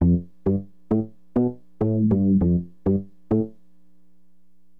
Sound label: SYN BAS, Acid Jazz Funk; Bass